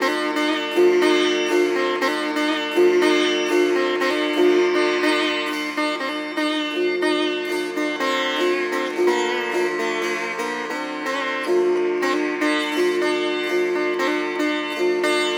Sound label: Sitar theme; raji an ancient epic